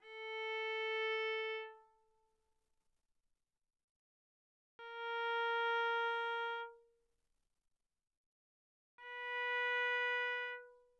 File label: Viola arco sul A mf A4 B4